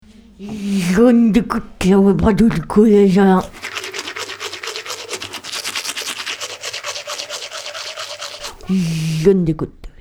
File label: jingle